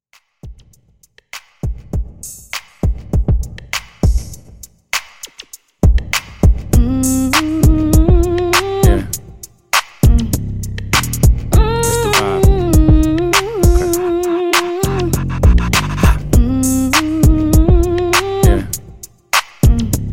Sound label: Redrum 100Bpm Dirty